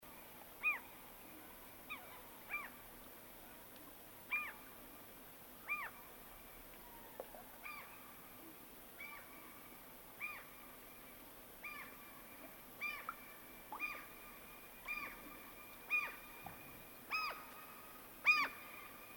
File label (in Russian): chajka priblizhaetsja delaet krug i udaljaetsja s krikami